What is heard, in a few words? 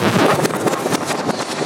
Scratching FX